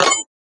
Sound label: Shop Not Enough; FREE SFX PACK VOL 3